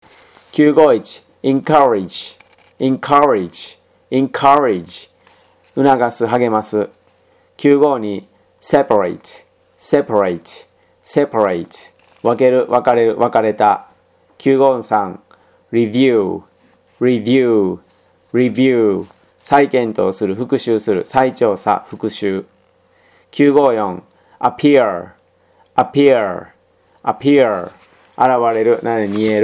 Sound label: tango